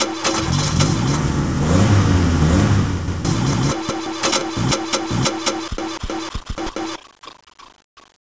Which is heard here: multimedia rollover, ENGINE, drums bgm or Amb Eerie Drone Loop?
ENGINE